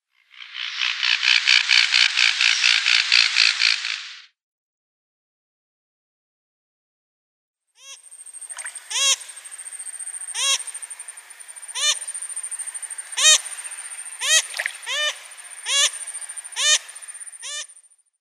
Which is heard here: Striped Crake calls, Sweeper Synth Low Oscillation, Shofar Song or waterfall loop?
Striped Crake calls